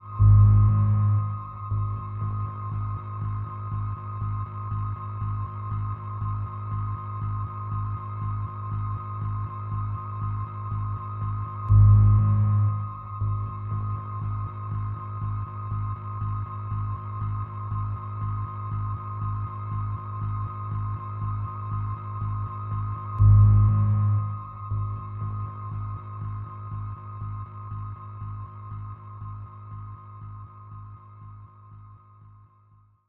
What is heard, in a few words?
music major choice